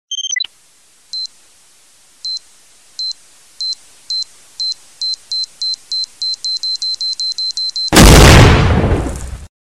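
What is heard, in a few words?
bomb blast ringtone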